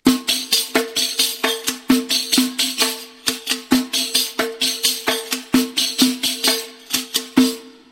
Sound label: riq